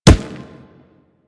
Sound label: Kubrow Run Dirt, Footsteps; Enemies; Warframe